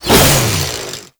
Chain Saw Tonfa Whoosh; Warframe; Grineer

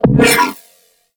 Shield Aura Attach Player; Warframe; Iron

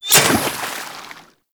Kunai Impact Water; Warframe; Hit Effects; weapons

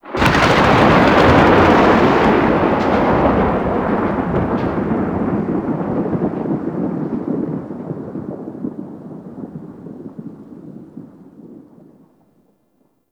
Shipyards Storm Thunder; Exterior; Warframe; Grineer Shipyards

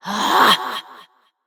Evil Twin Doppelganger Frustration; Battle Barks; Warframe; EntratiDoppelganger

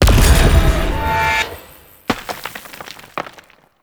Ground Blast; Duviri; Incarnon Okina Prime; Weapons

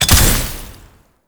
Invisibility Proj Activate; Warframe; The New War; Drifter; Abilities

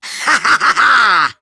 Laugh; Warframe; Grineer Catbrow Handler; Battle Barks

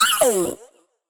Girl Pain C; Warframe; Zariman; Skitter Girl

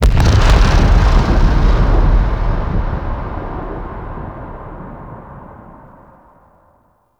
Final Explosion; Warframe; Missions; Venus; Orb Heist; Bounty Four

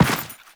step dirt, footsteps; Warframe; Teshin

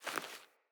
Sandals Walk Vegetation, footsteps; Player

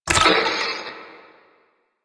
Play Next Video; Warframe; NineteenNinetyNine; Stage6TheCatCameBack

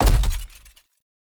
Step; Warframe; Grendel; Grendel Deluxe

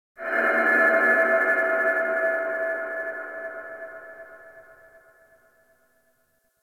Space Exterior Dist Call, Ambience, Space Battles; Warframe

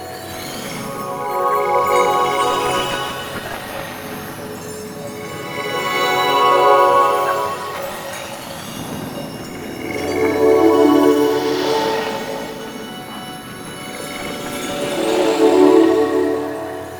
Collectible Fragment Loop; Warframe; Duviri

